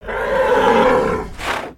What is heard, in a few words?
Pet Bison Disapprove; creatures pets